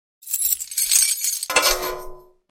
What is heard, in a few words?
armor Kneel